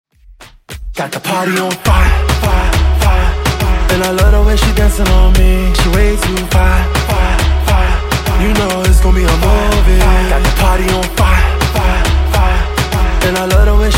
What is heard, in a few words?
Intro Outro Dirty 103bpm